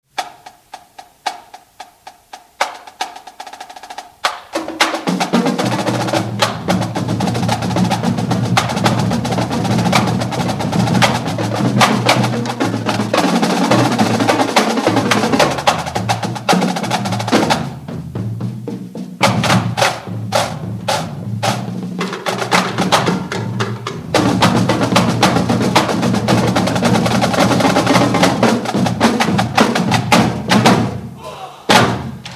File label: Echo Chex, drumline